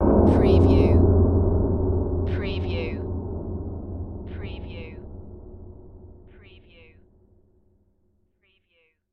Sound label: Hit Metal